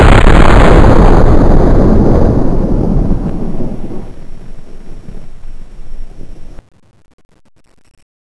fire, sawedoff

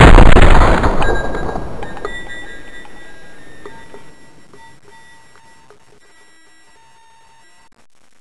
mp5k fire

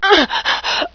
colette pain; svencoop; decay

